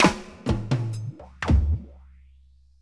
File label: Fills, Monster Beats; Zero-G